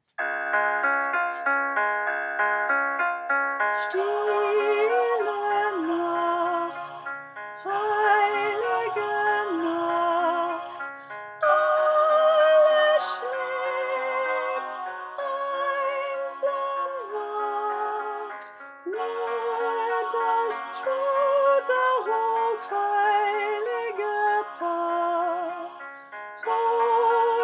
soprano; Stille Nacht; Voices of Remembrance; Folk Choir music

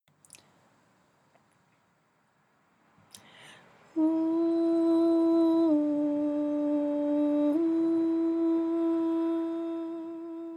verse with ooh middle

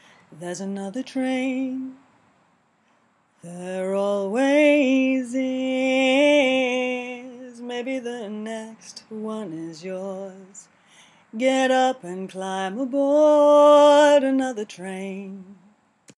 chorus low

